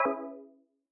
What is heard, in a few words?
point loose